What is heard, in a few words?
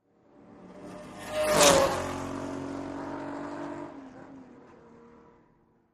Vintage Race Car; By, Vintage Race Car Cu Fast By R / L, Shot Cu From The Track; 1963-1966 Vintage Race Car